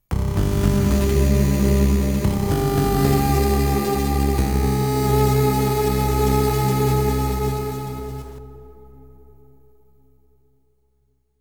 Start Demo BGM; A NOTICE; Konami MSX Super Best Antiques

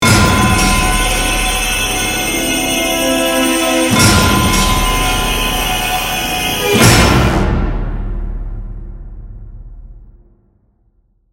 Swarm Infector

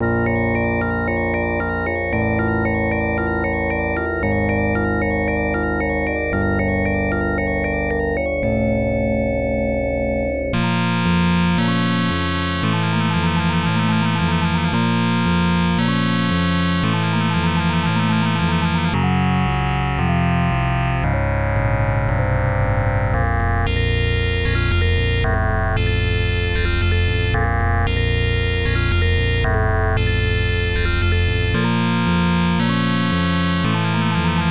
Final Boss BGM; Gemini Wing; X68000

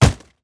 plastic hit; teardown ps5 windows xbox series xs gamerip 2022

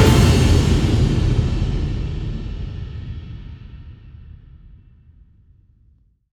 Battle Finale; Orders

